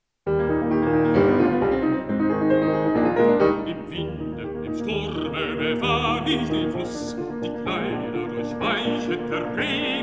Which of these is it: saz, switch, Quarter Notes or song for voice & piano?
song for voice & piano